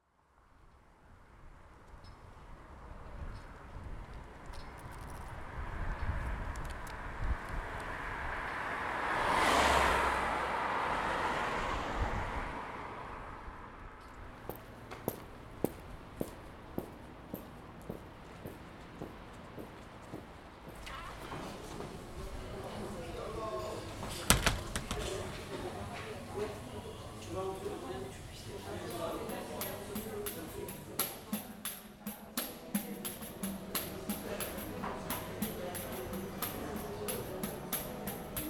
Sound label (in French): ambiance sonore; CARTE POSTALE SONORE; Montage; Claude